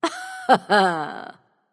heh heh; smite; sol

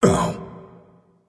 ouch; smite; loki

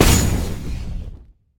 Second Hit; smite; horus

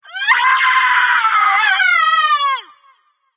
battlecry light; smite; voicepack; hel

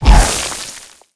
activate; smite; chaac

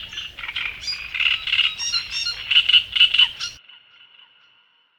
ambience bad birds; smite; conquest

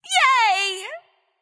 "yay"; smite; nuwa

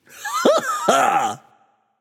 Heh Heh; smite; kukulkan

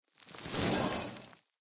shield spin; Smite; Cabrakan

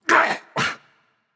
grunt hit; smite; tyr

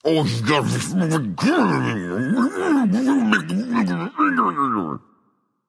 grumble; smite; odin

